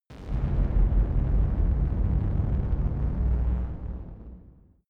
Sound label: heavy hell door